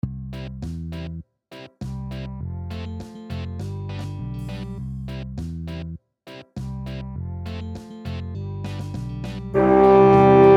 reaggy fuer horn

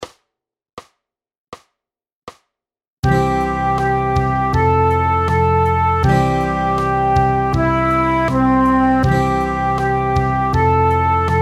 Backe playalong

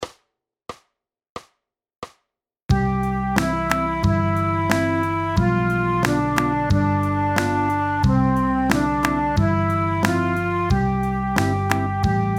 Haenschen klein playalong

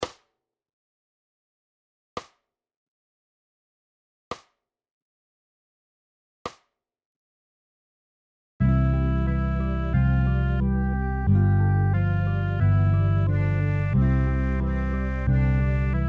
europahymne in c playalong

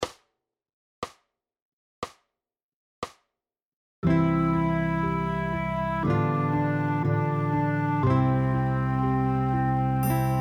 Schlaf kindchen playalong

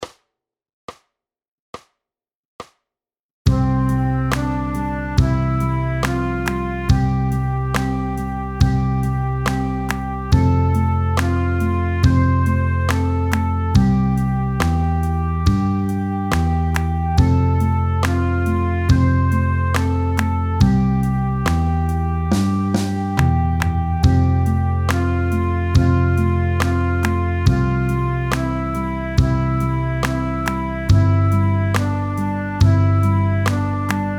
fuchs du hast playalong

